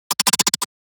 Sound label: Lurker Emote; Evolve; Gorgon